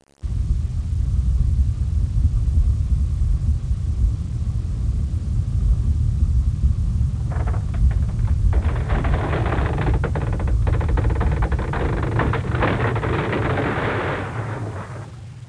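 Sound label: earthquake; disaster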